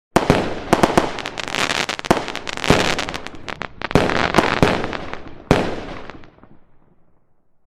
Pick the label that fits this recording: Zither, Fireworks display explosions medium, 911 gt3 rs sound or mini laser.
Fireworks display explosions medium